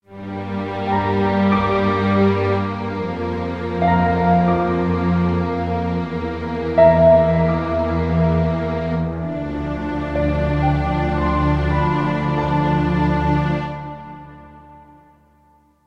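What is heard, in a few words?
sounds with an effect of completion, ending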